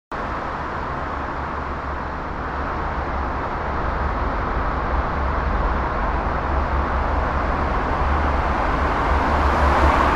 Mersey Tunnel, Atmospheric sound of Traffic passing inside the Mersey tunnel; Atmospheric sound of Traffic passing inside the Mersey tunnel in Liverpool.; Traffic and Cars